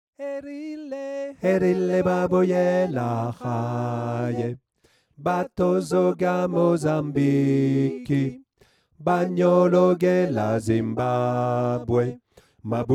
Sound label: Bass